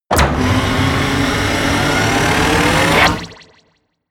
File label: wind up